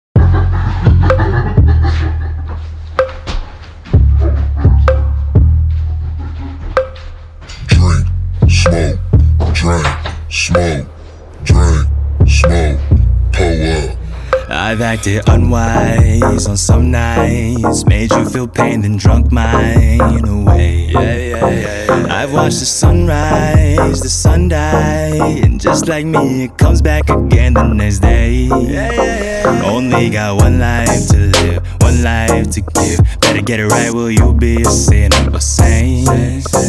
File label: hip hop mix master